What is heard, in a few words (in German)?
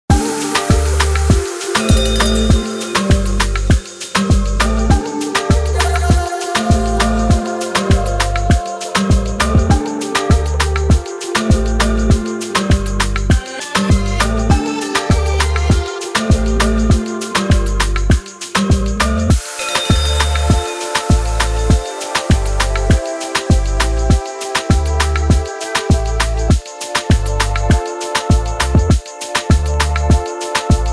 Music Theme Relaxed Promobett; RADIO OBERLAND